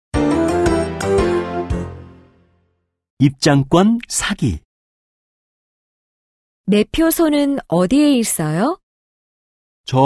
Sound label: K-POP